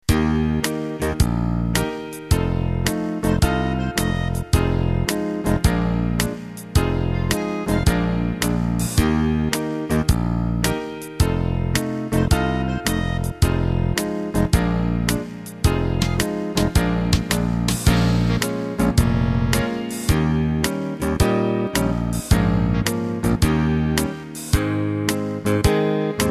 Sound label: Euro 2 Beat